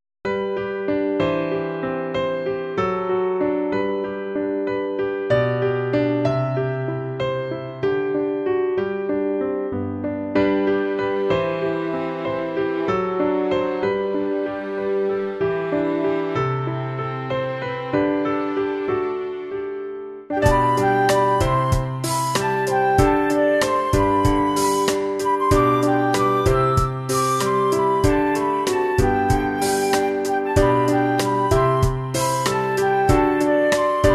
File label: bgm; yourdiary